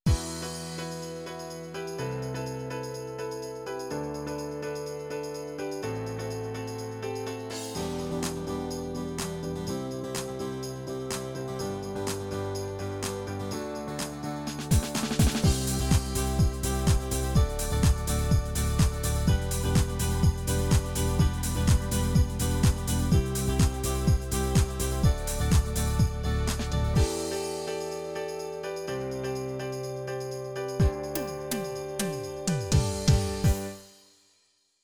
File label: Sky Pop